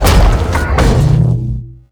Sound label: biomech blocker anchor shield